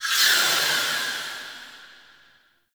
Infested Hub Menu Open; Deimos

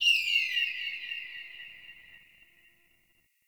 Forest Bird High; Warframe; Grineer Forest

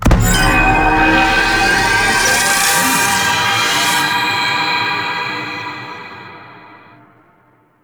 activate tower power stream; Warframe; The New War

